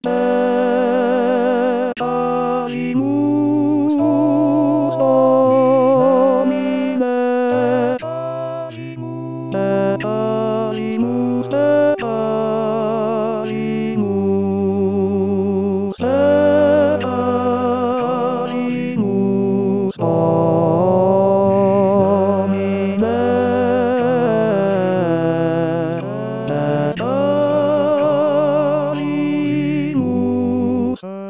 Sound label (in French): Tenor1 renforcé; Carissimi; Jonas